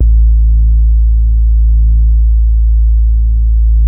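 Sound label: SUPPORT BASE, BASSES; Masterbits; Vintage Voltage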